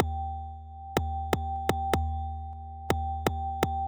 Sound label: tone; DreamBall